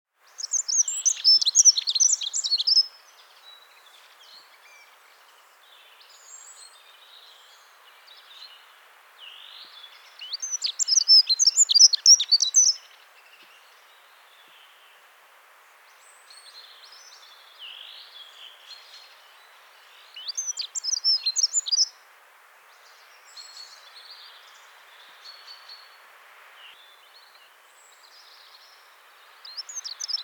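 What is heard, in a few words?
dunnock voice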